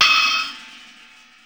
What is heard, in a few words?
GONG